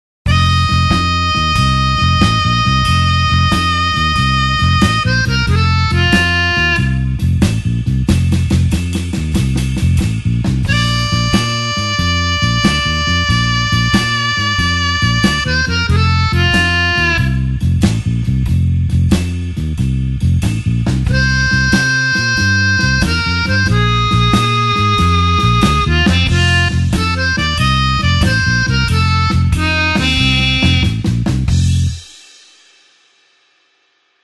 blues harp shuffle F

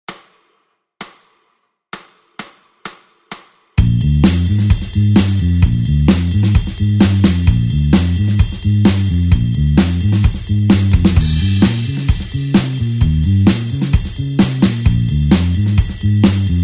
blues 8beat basic pattern Bb back

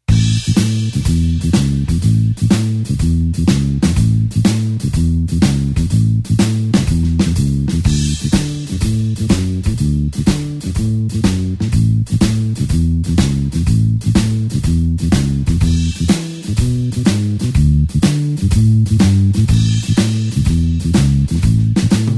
blues bassline shuffle A

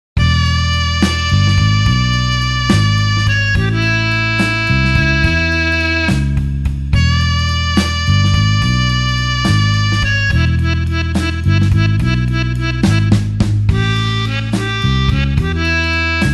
blues harp slow E

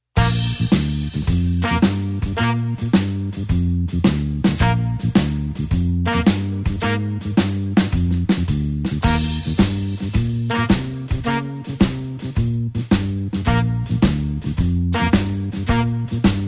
blues horns shuffle Bb

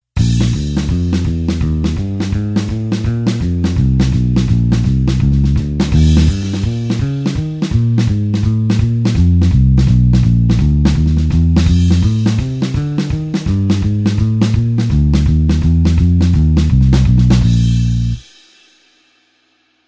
blues bassline 4beat Bb line

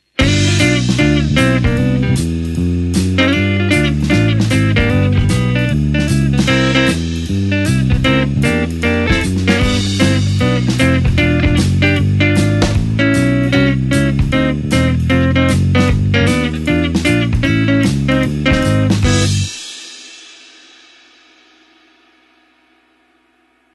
swing chord shuffle groove

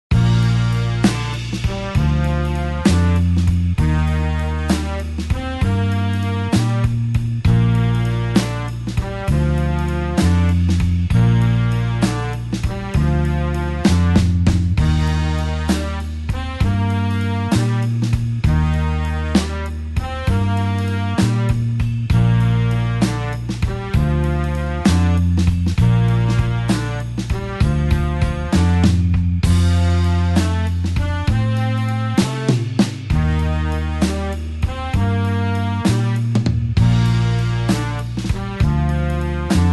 blues horns slow Bb

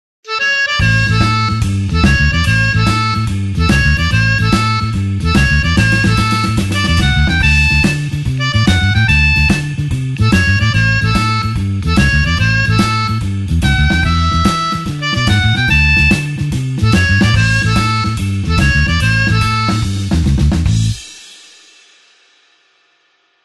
blues harp shuffle A

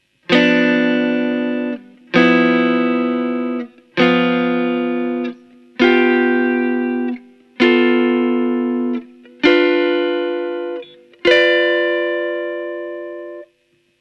swing chord G blues voicing top

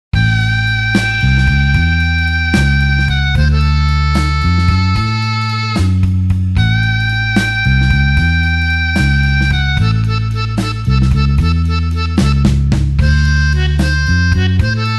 blues harp slow A tempo

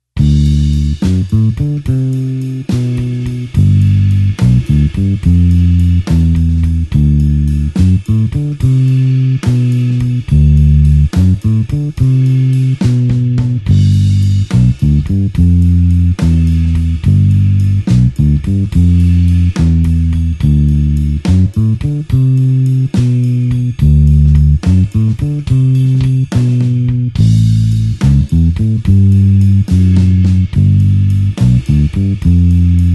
blues bassline slow D

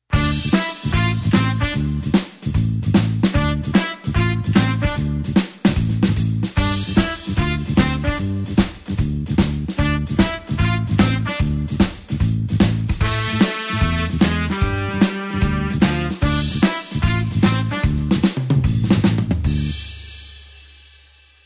blues horns shuffle D

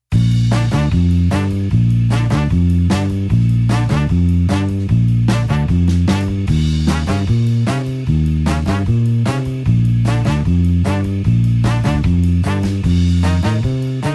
blues horns 8beat Bb

